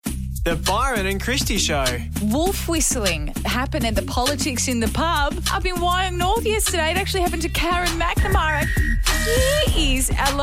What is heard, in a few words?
wolf whistling